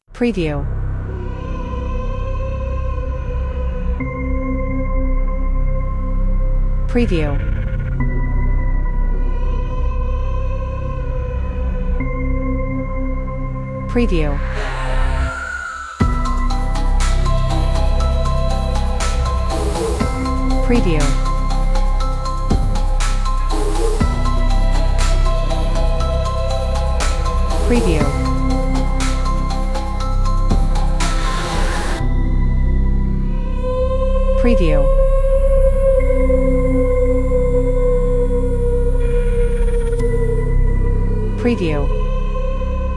New Dark, creepy ambient music

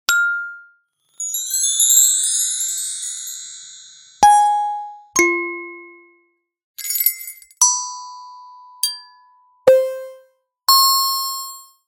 Bells, organic ui building game sfx; APPlicable Sounds

